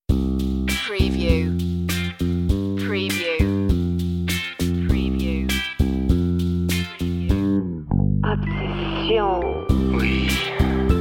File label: french love song vintage drum machine mix